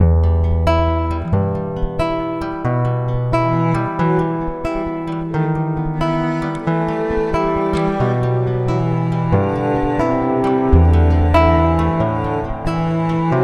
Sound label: Aguado Etude No 2